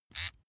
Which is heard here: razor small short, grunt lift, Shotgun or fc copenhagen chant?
razor small short